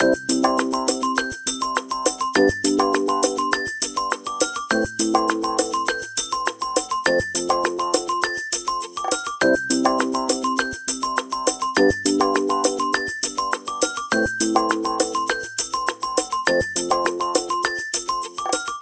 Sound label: CASUAL THEME 2 - LOOP 2; FREE CASUAL MUSIC PACK; CASUAL THEME 4; Casual theme loop 3